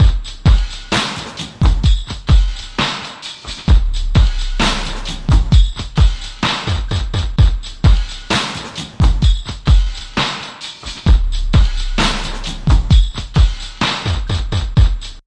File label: 065 relaxed roller, drum loops and breaks; 000 to 080 bpm